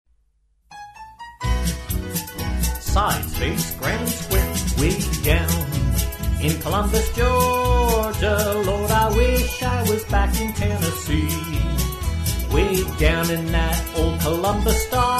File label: columbus stockade blues vocal mainstream, square dance